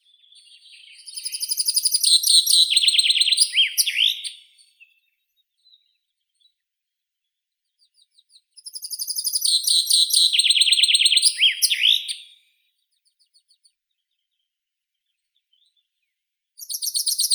zyablik fringilla coelebs, zvuki prirodi

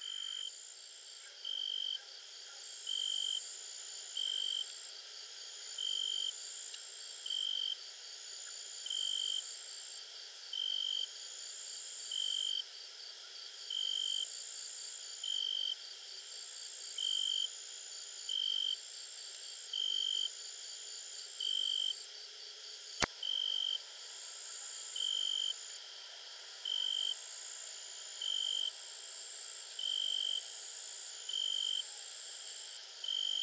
atmosfera nochnih zvukov, zvuki prirodi

